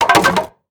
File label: 1H Staff Underlay Death